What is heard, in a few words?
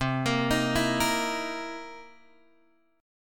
C 7th Sharp 9th C Guitar Open G